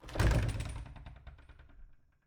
Cabin, front door, split door, oak, large, iron fittings, open, wide; Eiravaein Works; Vaeyan IV